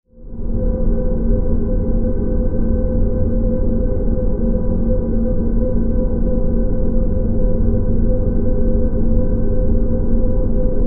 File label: Ambience, Cargo, Bay, Hum, Scifi; 5.1